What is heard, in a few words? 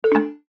go Back